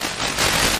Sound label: Harsh Sweep